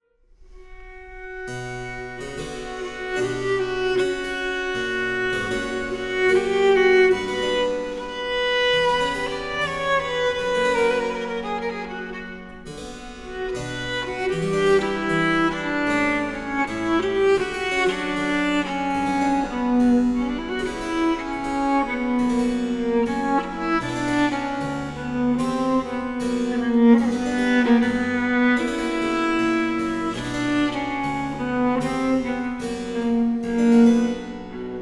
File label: Adagio in c; F Benda